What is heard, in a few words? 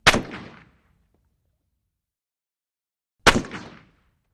Russian Sks: Single Shots. Dual; sks 7x6